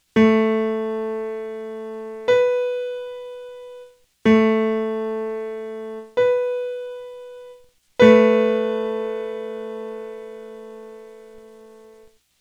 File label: Not Octaves, Pitch; Music by the Numbers